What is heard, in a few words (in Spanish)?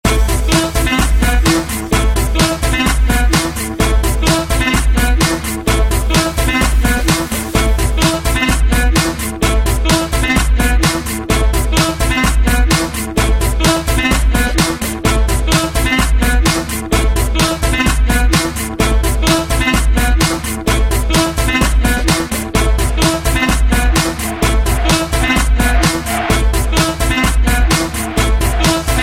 Saxo